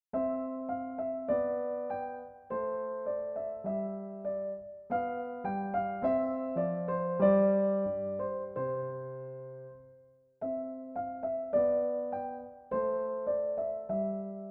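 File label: gavotte velocities pedaling modified, Pianoteq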